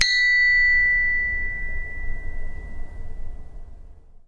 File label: chinese bronze bell side; sackler